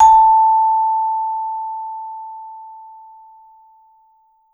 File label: VIBE MED FF A4, Vibes; Sampleheads - Dave Samuels Marimba & Vibes